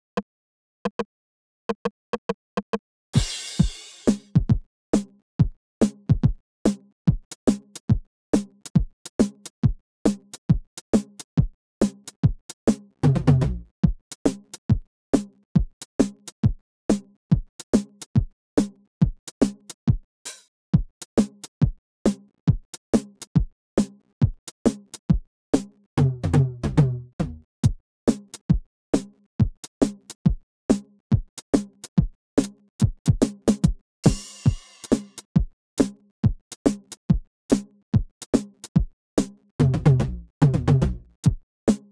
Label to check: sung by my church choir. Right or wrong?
Wrong